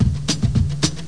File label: rhythm; Groovy Bytes: Behind the Moon; DRUMLOOP